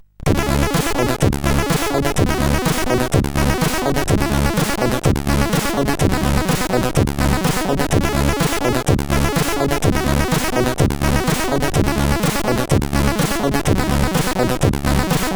Game Muzak, sid CSG8580R5; Moon

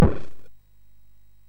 SFX, MOS6581; Narco Police

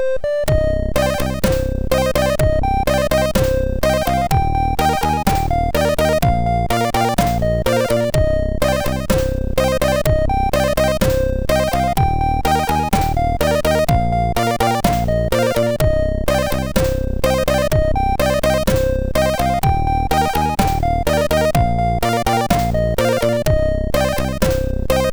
MOS6581R2 sid

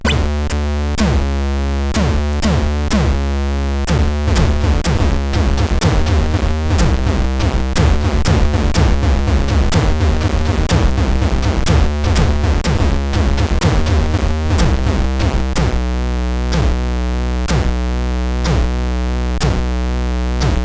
War Drums; CSG8580R5

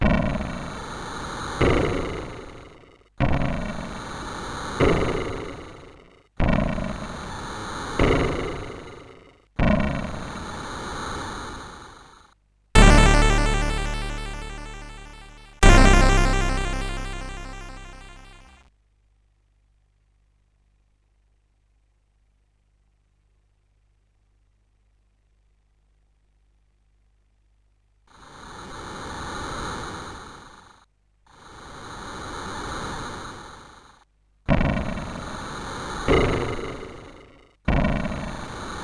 Little Intro Music, CSG8580R5

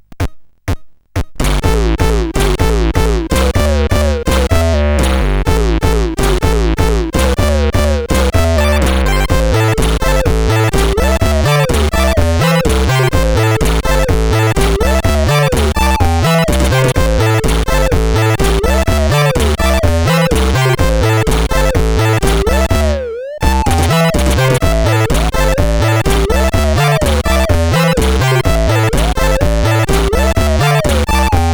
Rocktro, CSG8580R5

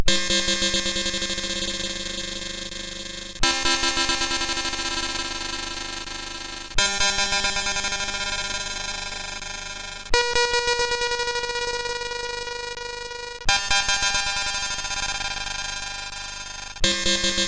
Spin, MOS6581R2

